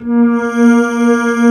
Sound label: SMOOTH PAD; East West; Global Sounds